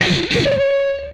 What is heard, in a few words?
Ker Ching; retro arcade